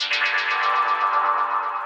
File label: synth pad; Chanel Basic; Ableton; Glitch and Wash